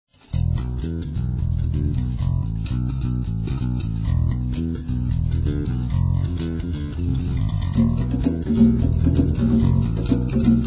Balkan Flamenco

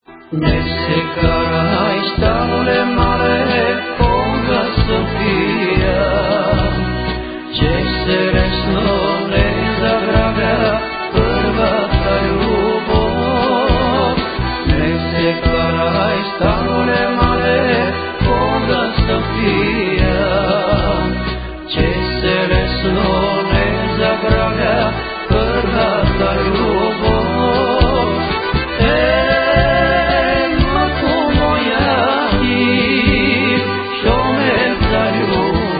male

